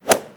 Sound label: golf hitting